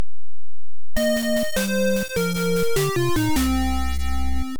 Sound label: Battle Lose; Hit the Ice; NES